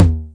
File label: 909 Lo Tom; DRUM MACHINES; 909 Extended